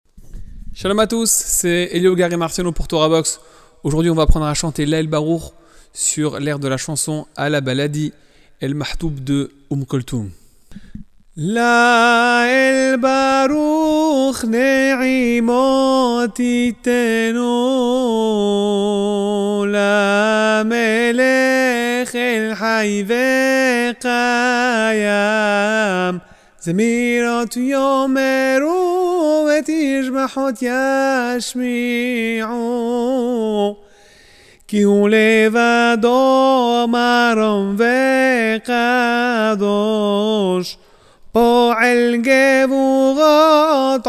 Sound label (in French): lael baroukh sur l air de ala baladi, tefila